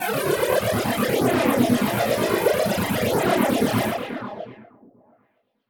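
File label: Modulation Processed, alarm siren samples 125bpm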